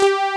FAT SYN, JV 1080; Pro-Rec - Synthsonic

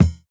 Kick, jungle breaks drum sounds

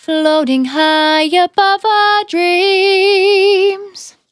Finally 70bpm C, Velvet Vocal; Zero-G

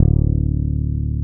Basses, Roland

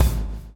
BLOW; Spectrasonics - Backbeat

